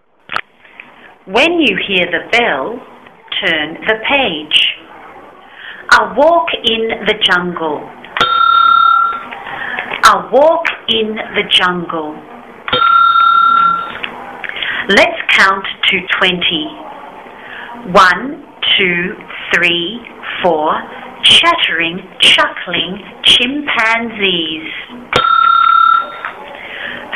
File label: A walk in the jingle